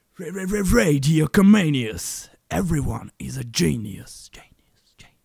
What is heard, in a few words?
jingle